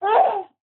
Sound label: baby boy yeahea; nova sound